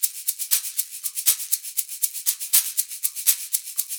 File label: 120 SHAK, Percussion Loops